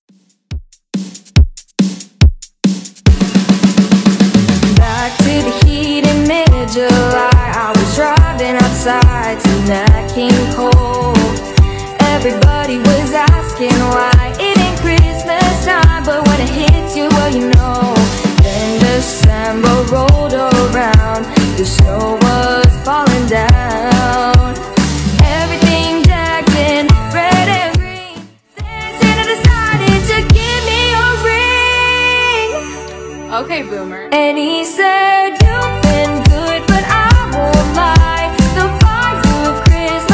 Christmas Redrum 141bpm

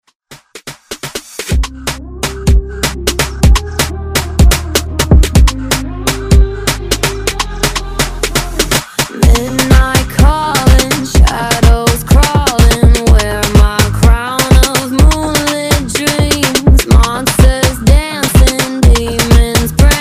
Afrobeat Redrum; Halloween

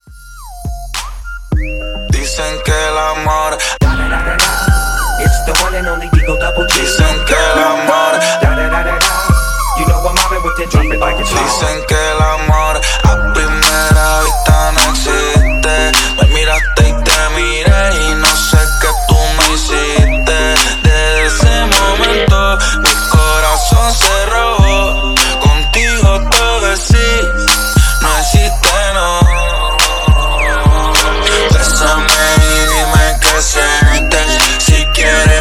Top40 Latin HipHop Breakz With Hype 104 BPM

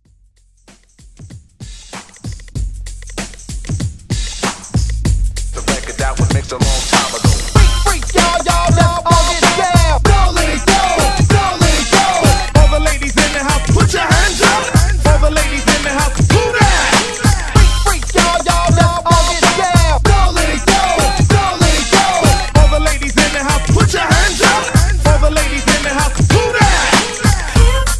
Throwback Redrum Hype

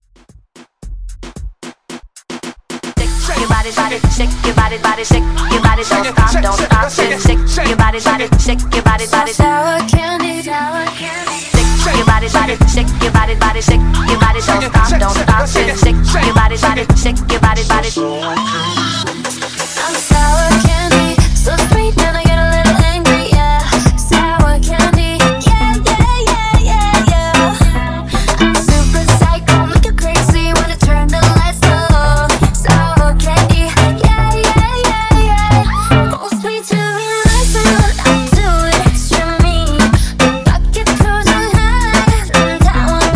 Pop Moombahton Redrum Hype; Remixfreakz

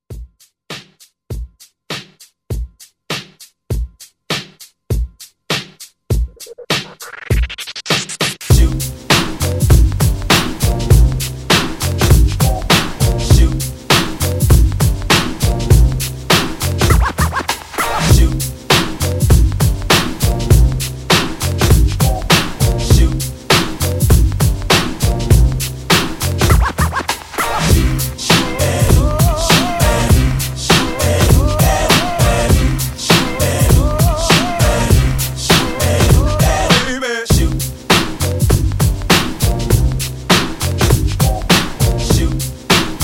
90s Old School Re Drum

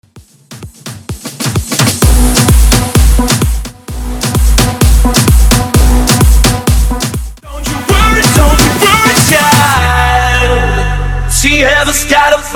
Acap 129 BPM

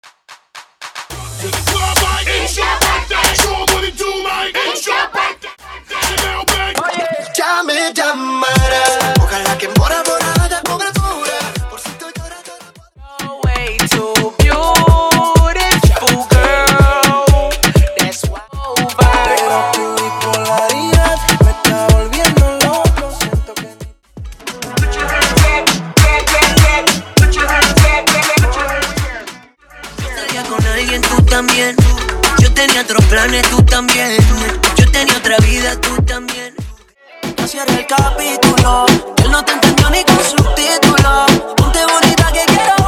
Latin Reggaeton

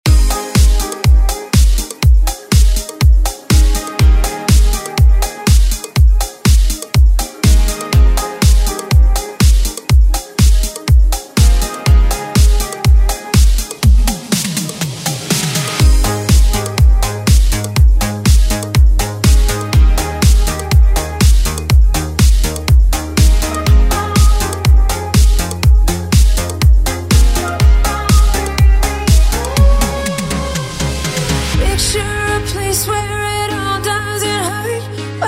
Dance Bootleg; Deejay Hit

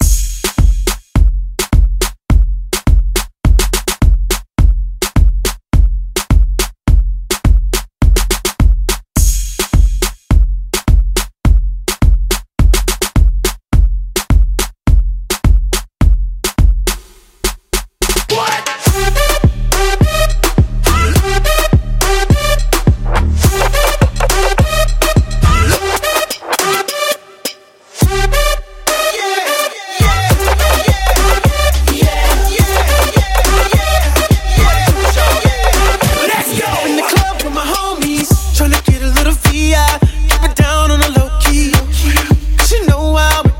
Trapleg RMX 105 Bpm

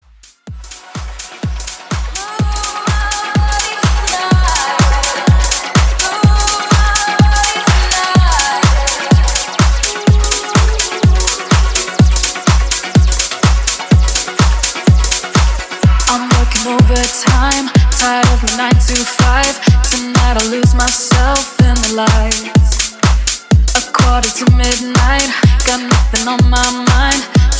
Pop House Dance Extended In Outro 125 BPM; remixplanet

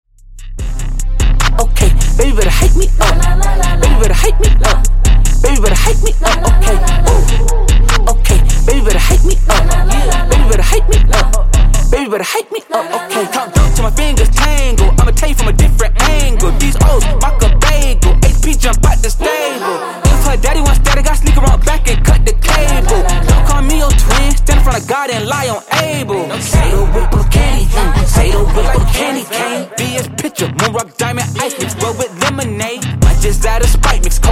Dj Intro Outro Clean 74Bpm

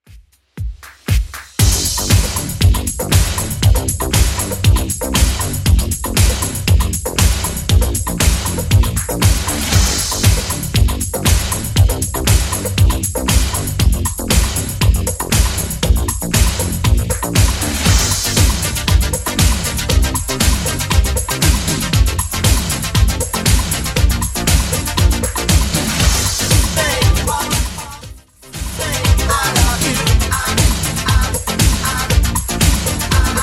80s Redrum 118bpm

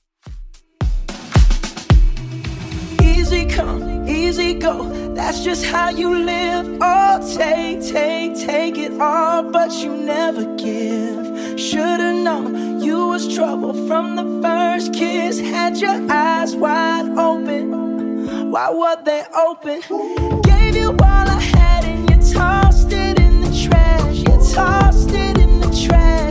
2ks Redrum 110bpm

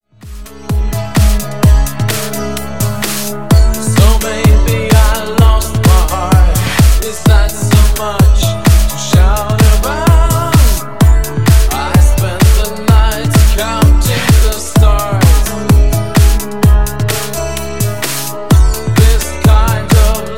Funk Nudisco 128Bpm

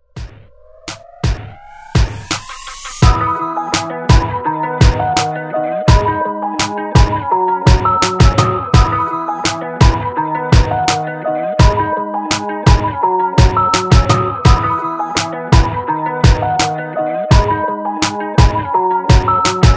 Top40 Redrum

